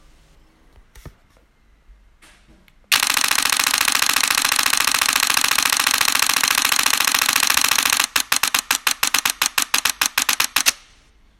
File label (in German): D5 12 Bilder Sec; Nikon D5; 14 Bilder Sec